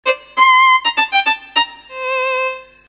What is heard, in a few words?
viol